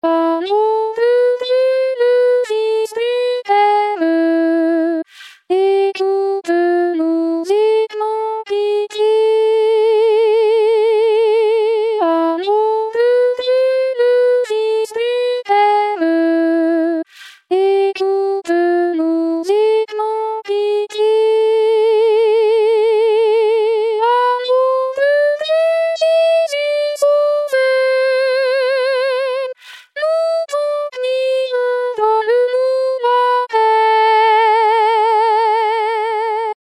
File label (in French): Agneau De Dieu, Soprano; Sur Le Choral Wer Nur De Lieben